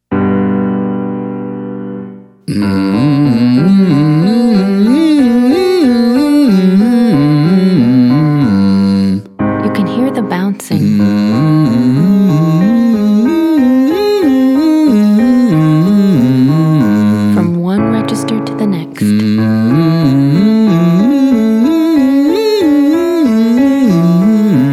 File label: hum mixed scale male; Singing